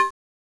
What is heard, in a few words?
Saw B2